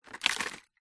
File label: leaf dry drop fall on others; zapsplat